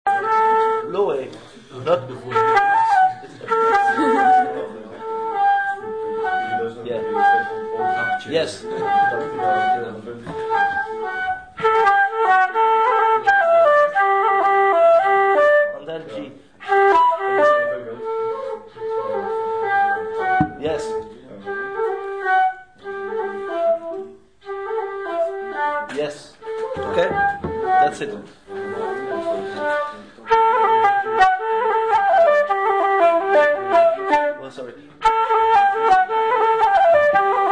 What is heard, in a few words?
octave jumping